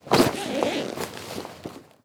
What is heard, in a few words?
equip; Rust